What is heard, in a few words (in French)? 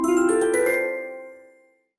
badge epique